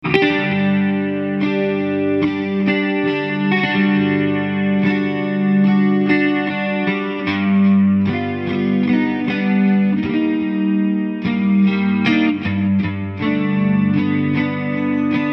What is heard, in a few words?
XTL Clean; XTL Patches